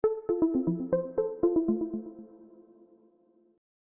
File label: Ring; alarm